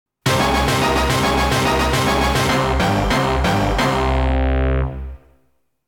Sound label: Stage Clear